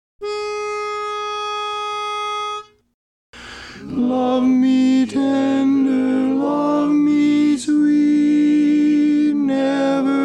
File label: Tenor Only; Enrichment Track